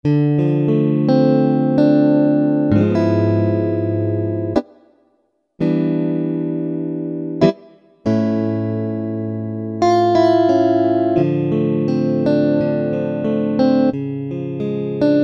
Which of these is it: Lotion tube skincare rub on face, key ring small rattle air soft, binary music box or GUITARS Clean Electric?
GUITARS Clean Electric